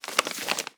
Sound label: Dry Short Paper Crumple